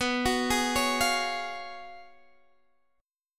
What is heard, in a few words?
Gb Major 7th Suspended 2nd Suspended 4th